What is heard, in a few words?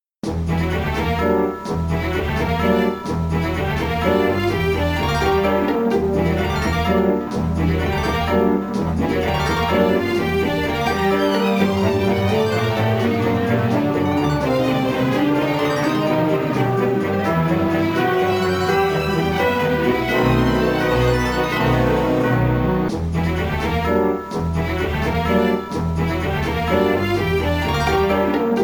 Multiball, pinball; Wasteland & Cryoprison; demolition man williams pinball arcade